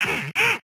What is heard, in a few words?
Lunge; Joblin Freelancer